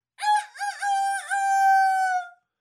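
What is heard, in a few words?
creaky sound of a rooster's song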